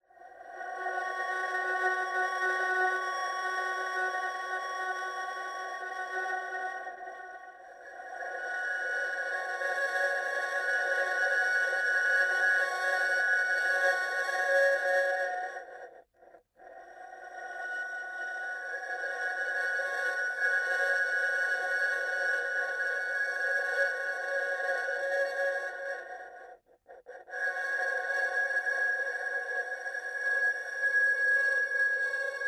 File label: Erhu recordings